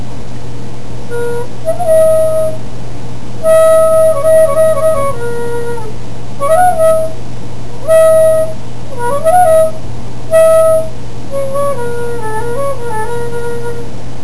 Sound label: flute song first part